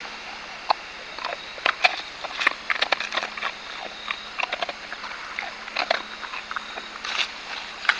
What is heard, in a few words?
bird